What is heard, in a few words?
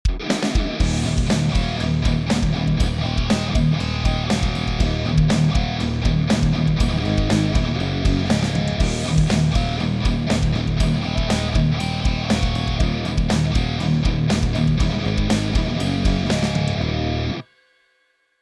Just Rhythm